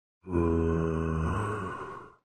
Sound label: zombie bruh sound effect; minecraft